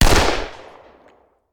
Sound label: Hand Gun; Sound Ideas; Guns